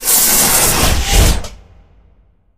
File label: elevator door close